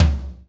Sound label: Tom